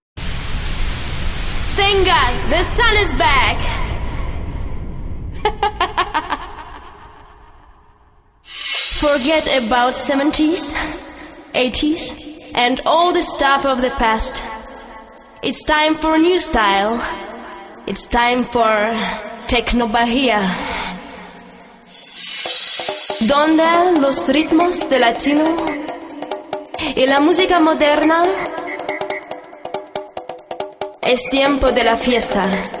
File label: tekkno acapella